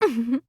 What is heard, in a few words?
giggle